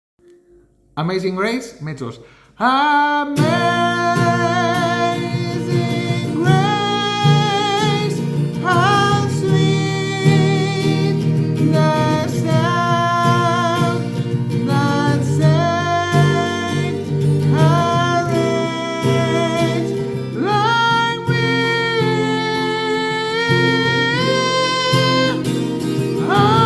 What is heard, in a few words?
amazing grace mezzos